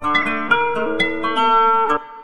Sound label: KOTO LP FX, Asian Traditions; USB Soundscan vol.60; AKAI; KOTO FXLP